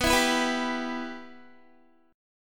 B Suspended 4th Sharp 5th, Guitar; Bsus4#5; strummed; 18,19,16,16,19,x

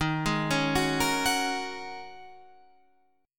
G sharp 7th Suspended 2nd Suspended 4th

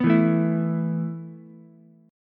B 7th Suspended 4th B down Ukulele; B7sus4 strummed; chord shape; JGuitar

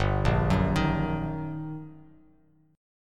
G Minor Major 7th Sharp 5th, down, Bass Standard; GmM7#5 arpeggiated; JGuitar

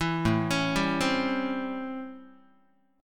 A 7th Sharp 9th, Guitar; A7#9; arpeggiated; JGuitar

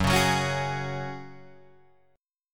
F sharp Suspended 2nd, Guitar Drop D

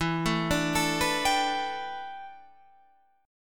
A 9th Suspended 4th E

